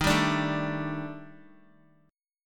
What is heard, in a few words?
Eb Major 7th Flat 5th Eb Guitar 4F 4A 5C 5G 5C 6E; EbM7b5; strummed; JGuitar